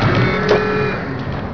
REPAIR

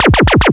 LASER

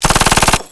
gunshot

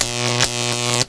ELECTRIX

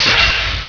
LAZER

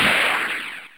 TWANG

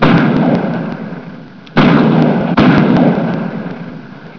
mult gun

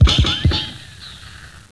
ricochet

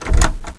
chest

